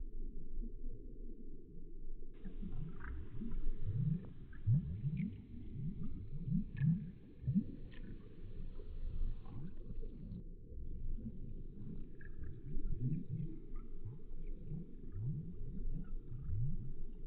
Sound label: sludge, ambience; AssaultCube